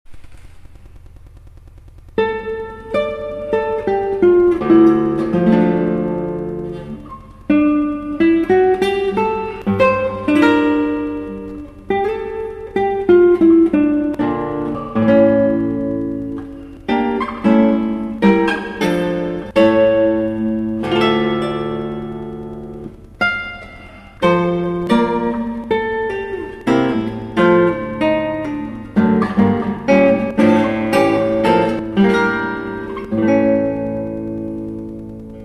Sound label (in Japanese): エンデチャ, ギター; ギターはアルカンヘルで